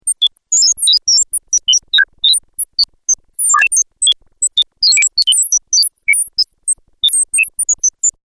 Computer Calculating Operation, Sounds Like A Computer That Makes Calculations; Computers